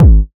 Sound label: solid kickdrum sound g sharp key; kick drum samples